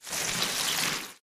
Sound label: vine withdrawal; jumanji